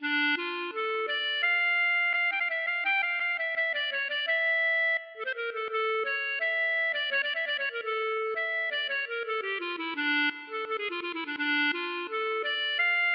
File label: klezmer